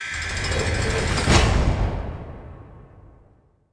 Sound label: city jail, buildings